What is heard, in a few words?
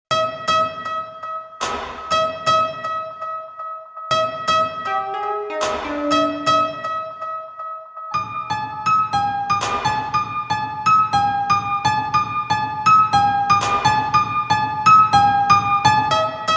Music Loop Stem; gamerip; Zombie Army Trilogy; PS4